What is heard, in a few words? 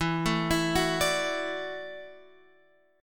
E 7th Suspended 2nd Suspended 4th Guitar Open F; arpeggiated